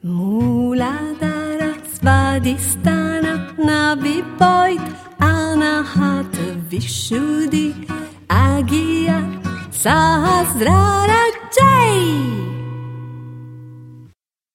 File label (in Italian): Canzone